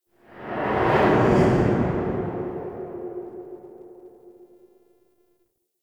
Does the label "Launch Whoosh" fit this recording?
Yes